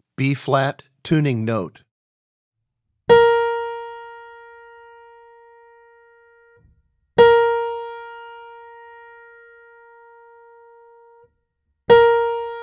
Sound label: Tuning Notes; James „Jamey“ Aebersold Play-Alongs